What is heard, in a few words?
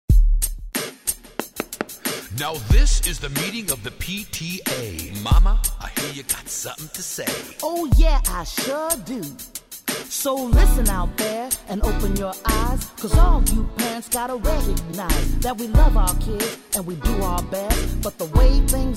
rap